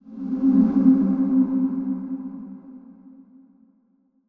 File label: D R O P S A M B